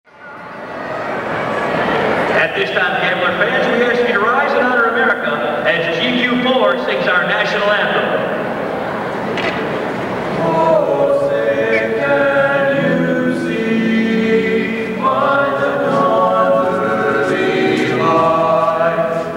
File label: Barbershop Quartet